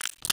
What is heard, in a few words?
tool pickup